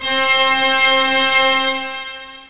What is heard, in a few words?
full pipe organ; OctaMED SoundStudio V1; mirage